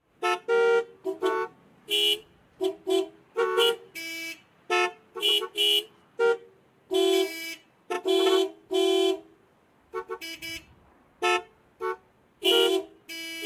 many car horns honk close sparse, shorter honks, traffic jam; Blind Drive